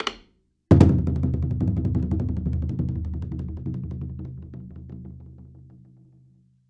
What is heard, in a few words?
chu daiko stick fill roll; TKO